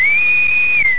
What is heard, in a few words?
BOATSWAI